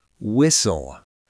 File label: whistle